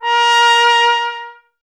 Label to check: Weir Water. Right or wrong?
Wrong